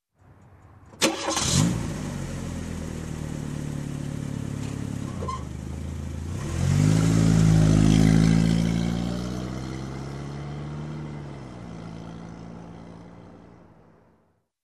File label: Old Car engine ignition and drive away